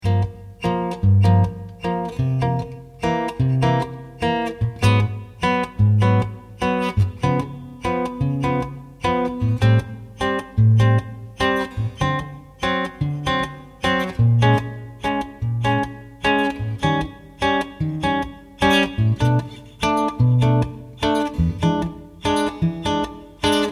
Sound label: II V half note up on Gm7; Lick concepts